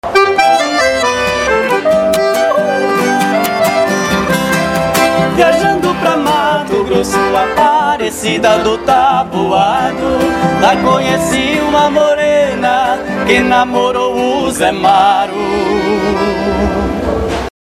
vinheta cantada

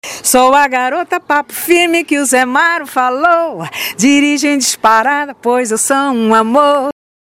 vinheta cantada

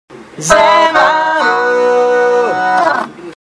Vinheta cantada

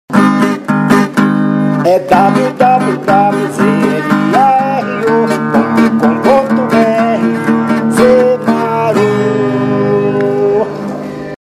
vinheta cantada

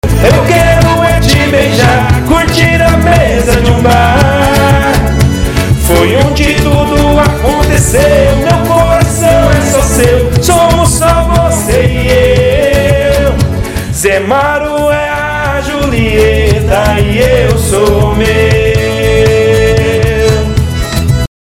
Vinheta Candada

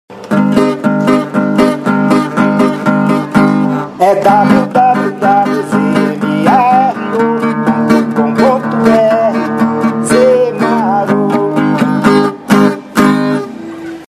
vinheta cantada

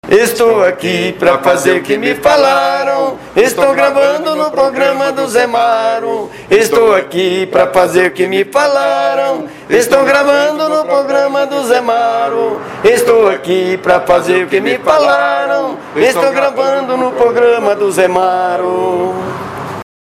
vinheta cantada

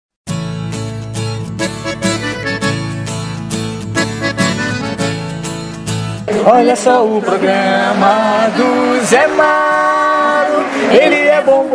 Vinheta Cantada

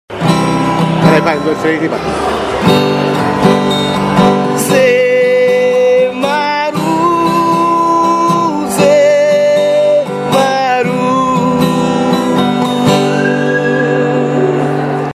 vinheta cantada

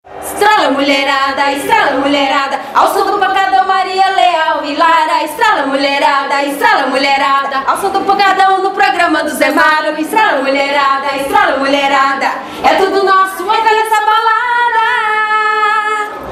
vinheta cantada

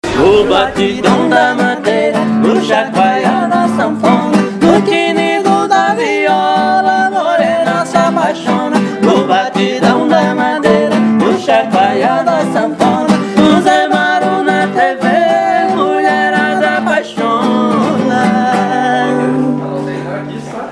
vinheta cantada

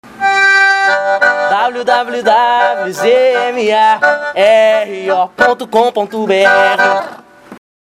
Vinheta cantada

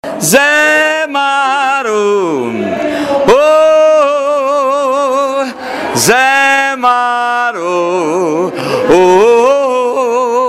Vinheta cantada

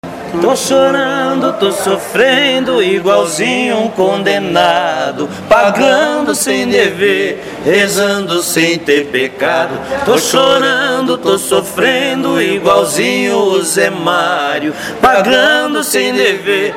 vinheta cantada completa

